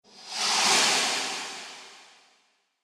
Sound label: Subtle Whoosh Invisible Rush; Whoosh Sound Effects; Science Fiction Sound Effect